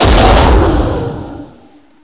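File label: phoenix explosion; zmall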